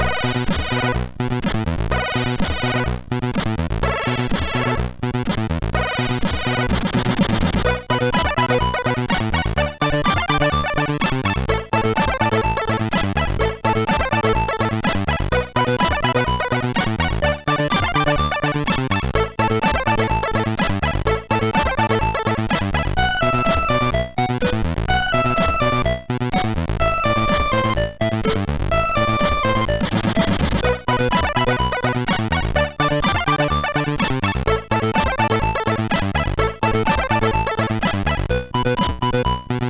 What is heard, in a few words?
New Party Tune, MOS6581R3